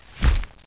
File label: flap!